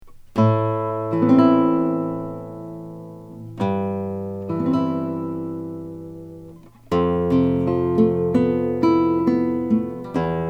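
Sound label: Wingert parlor classical Brazilian rosewood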